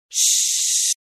Male Shh

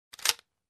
Gun cocking sound

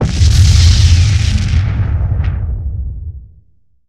Massive area explosion

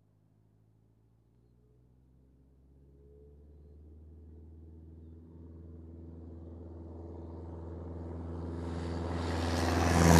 Biplane on runway passed by

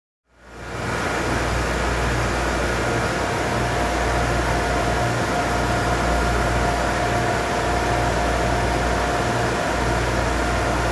Loud Generator Noise

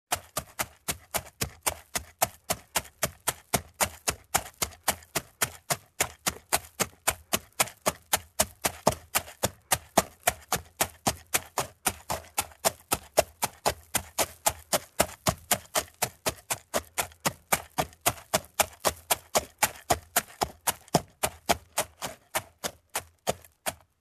Horse Gait on wet surface

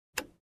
Overhead Bin lock click sound

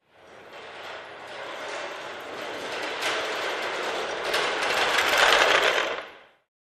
Hand Truck Trolley Push Cart

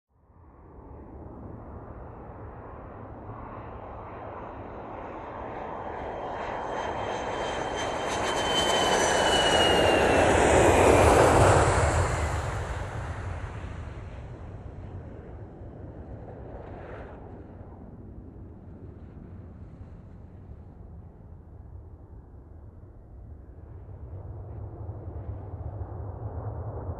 Airliner on runway taking off

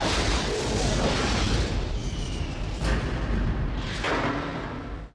Fire Blast

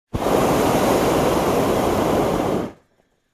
Air pump sound

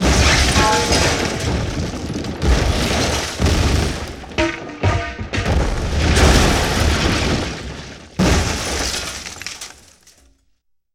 Explosion with metal and wood debris scattered

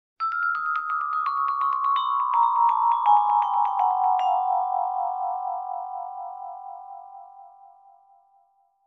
Icy vibraphone melody scale down

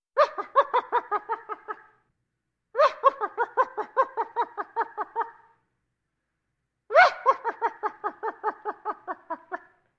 Funny evil laugh

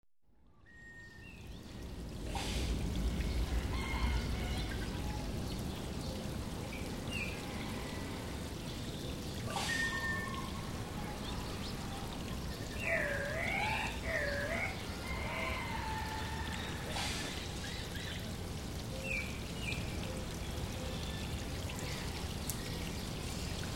Water Stream and Birds chirping

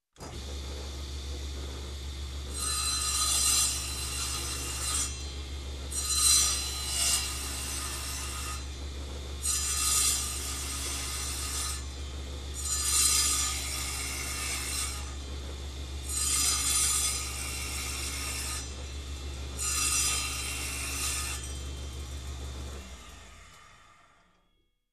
Angle Grinder Cutting Sequence

